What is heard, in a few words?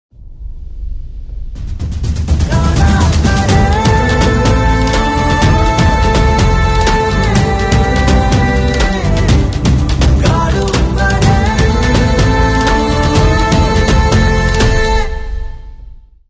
Promo Bgm Ringtone; RadheShyam